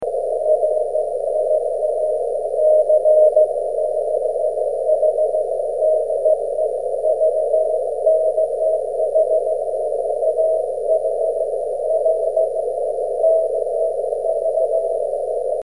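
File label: 13cm continuous wave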